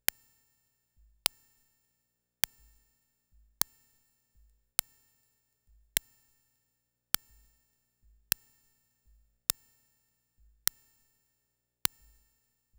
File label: Click SDS7, Simmons drums; SDS7 Click only, filter wide open.